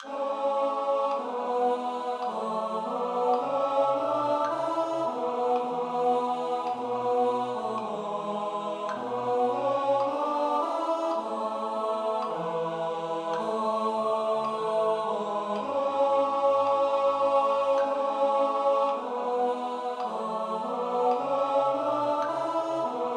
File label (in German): Zwar ist solche Herzensstube Tenor; Kantorei